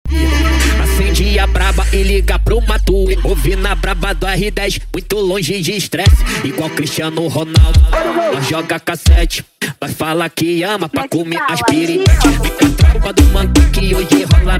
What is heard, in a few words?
forro funk